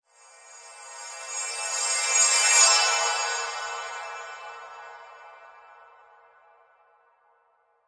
Magical Shimmer Swell, Magic sound of casting spell, conjuring or wizardry; Witchcraft ritual summoning elemental or otherworldly